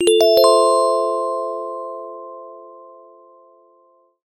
refreshing morning cool sms tone ringtone

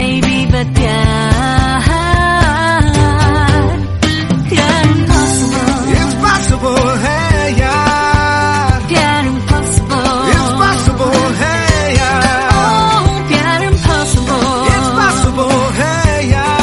background music tone ringtone; nokia ringtones

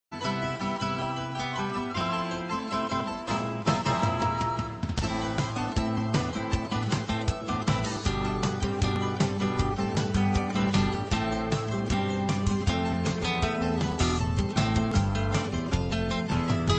baroque ringtone; kaijing

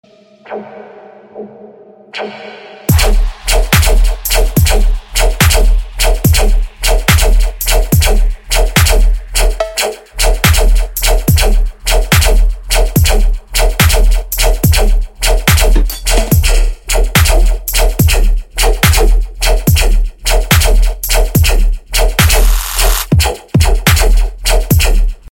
riddim dub

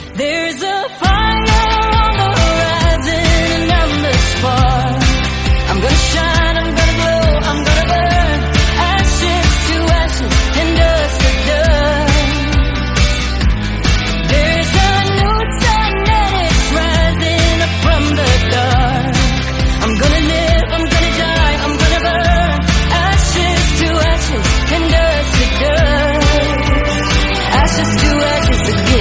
dance english song ringtone

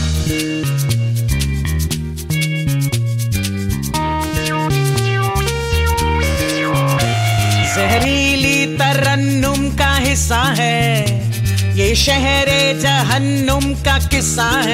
ringtone, bollywood; revolver rani

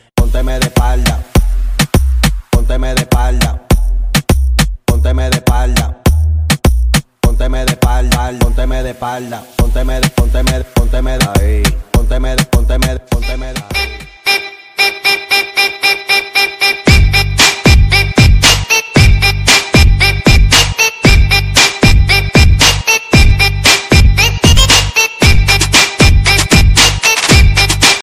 attitude boy ringtone, cool ringtones

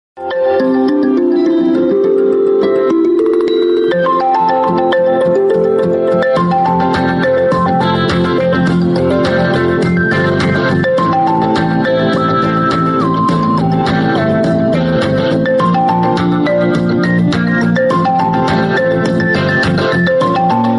classical mobile phone ringtone

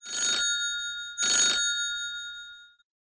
samsung original bell ringtone

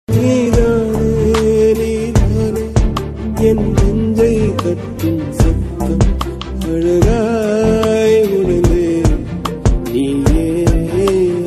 male version

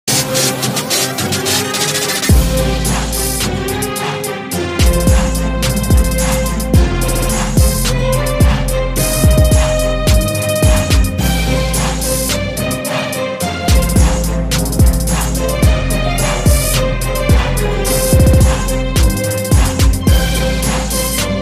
trap remix ringtone

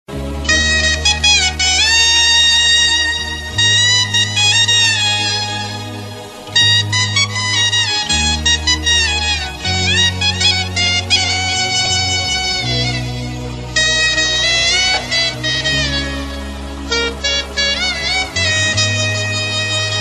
bollywood classical ringtone

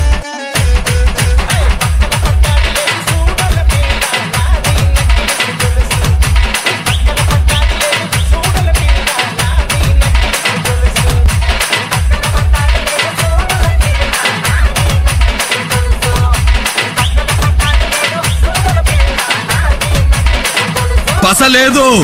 dj song

